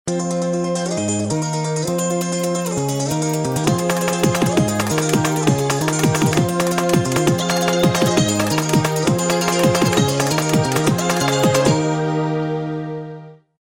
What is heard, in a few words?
crazy music song ring